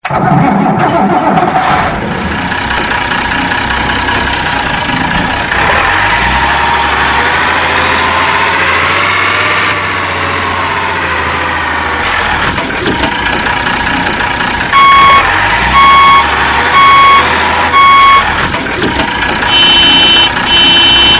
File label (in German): Truck Unimog 406; Soundbeispiele